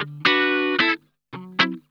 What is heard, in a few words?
GROOVIN, Funk Guitar; Zero G - Funk Guitar